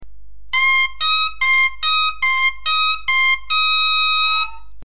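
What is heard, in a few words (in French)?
do mi octave, bombarde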